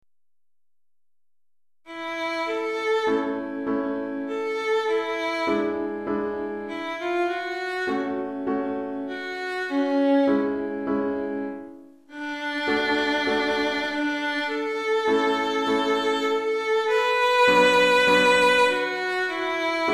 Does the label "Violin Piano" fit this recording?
Yes